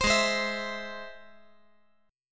Ab Augmented Ab; Ab+ strummed; JGuitar

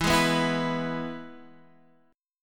E-Major E, Guitar Half Step Down

